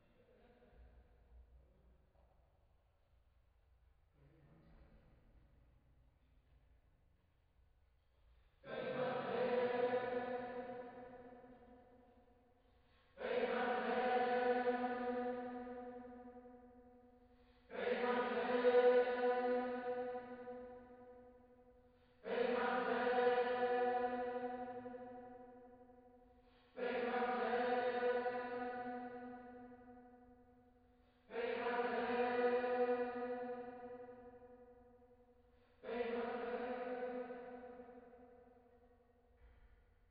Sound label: Choir